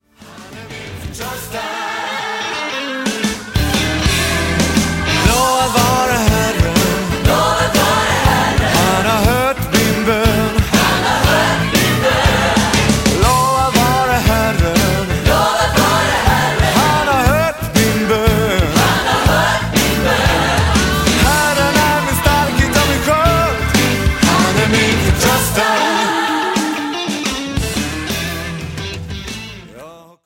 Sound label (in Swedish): Lovsangsblues